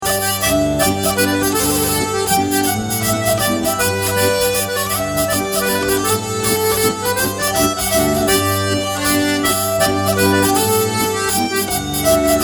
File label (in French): mazurka, Musique du Morvan; Mazurka du Beur-t; mazurka du Jean Tir